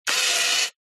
technology camera dslr lens zoom canon dslr eos 6d; zapsplat